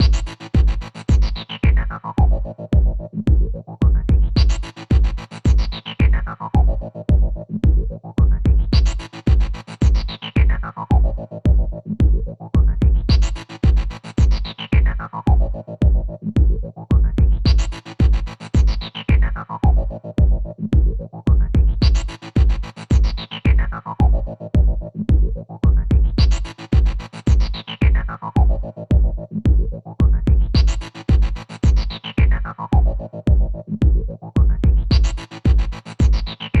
Guitar

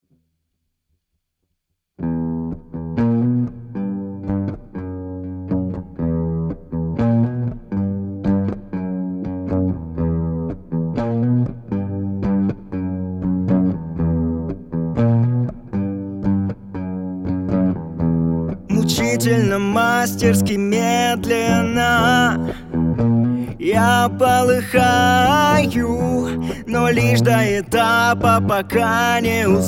minus drums

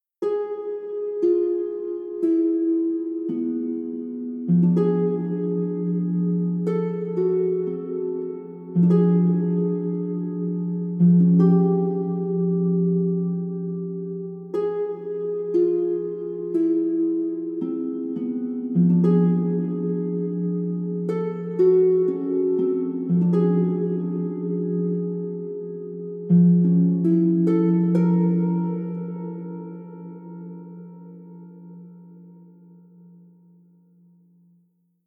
Orchestra; Warhorse Studio Orchestra

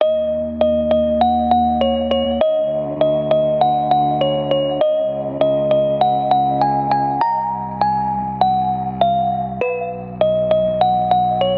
Game Over Music; Tricky Mod 2.0

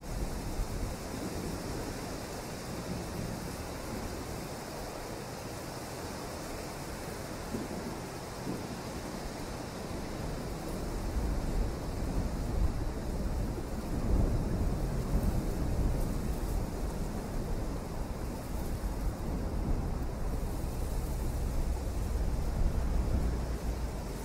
Grass Field Thunderstorm Wind Sounds; Steve Brassel

